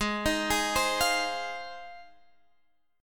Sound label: G sharp 6th G sharp down Guitar Drop D, chord